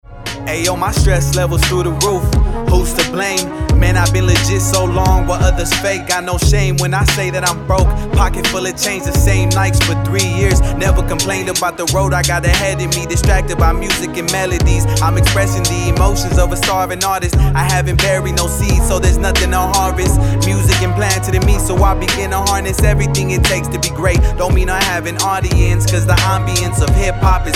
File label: HIP HOP